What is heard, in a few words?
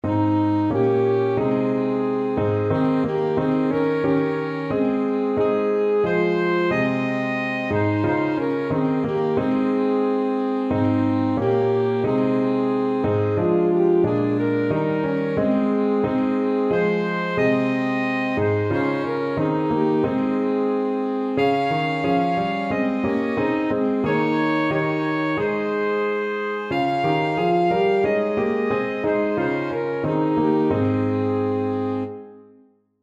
once in royal Alto Saxophone duet